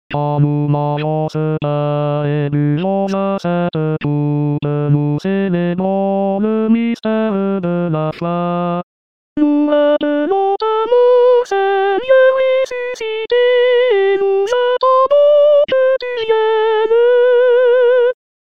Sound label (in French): soprano, chorale